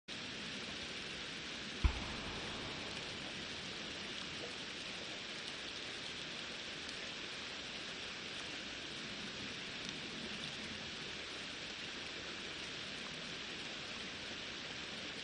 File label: riverside; Kyoto U; Ashiu